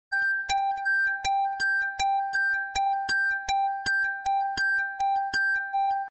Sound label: beep tone; google